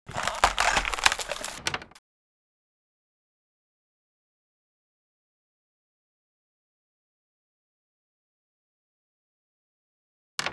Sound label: tree creak, ambient